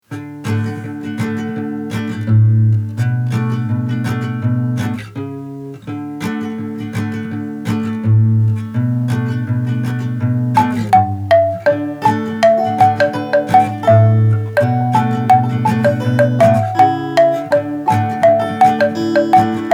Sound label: Happy Bongo